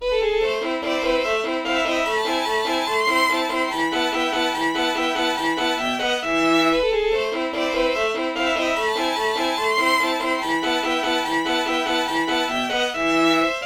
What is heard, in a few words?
violin